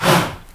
"HA", Heart of Africa, shout; Spectrasonics; Heart of Africa 1; CH. SHOUT1

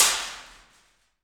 PRC ALMWA, Aluminum Wall; Rhythm Section; FX Perc

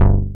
PLAY BASS; Pro-Rec - Synthsonic; BASSES

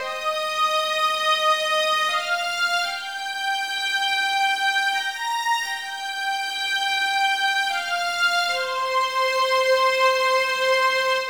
Strings, 70s Analog Funk; Sonic Foundry (Sony Creative Software); What it Is! 70s Analog Funk; Session 15 - Wild Child

